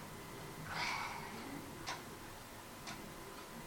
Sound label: breath